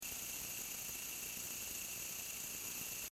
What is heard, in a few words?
motor side